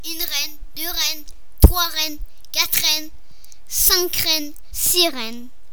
sirene

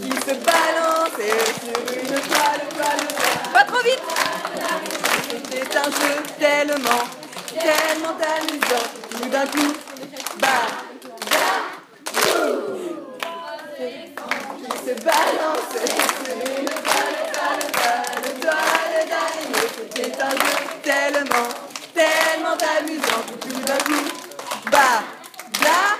chorale comptine musicale

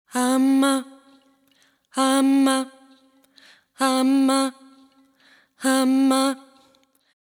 chant voix

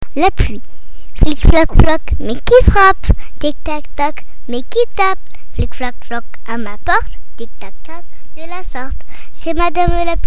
la pluie

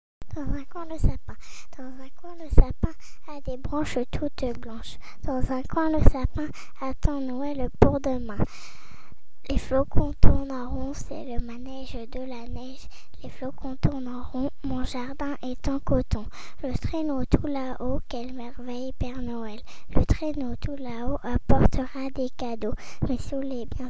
dans un coin le sapin

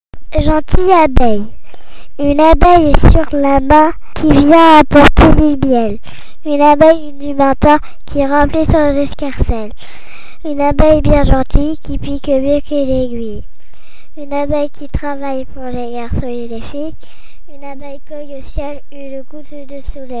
Gentille abeille

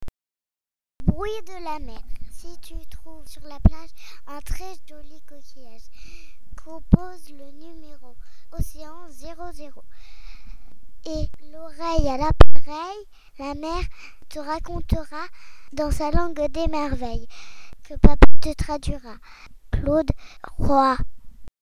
Bruit de la mer

